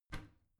bathroom cabinet both open regular; objekte; tueren

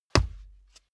step barefoot wood parquet single trample; schritte

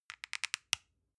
lotion lid open soft; berufe; friseur

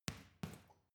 boxing punching bag side hook double soft

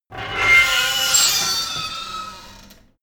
metal door screak thriller close

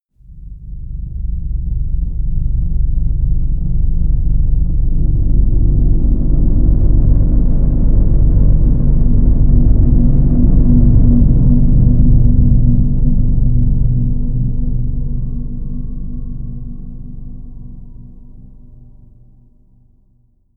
rumbling swell; risers

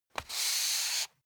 step barefoot wood parquet slide long; schritte; Footsteps

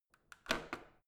washing machine creaky rotary button quick; haus garten; haushalt

